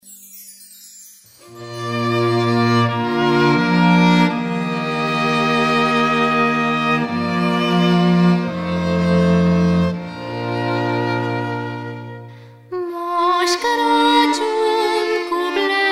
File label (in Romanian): cvartet; arpeggione; mos craciun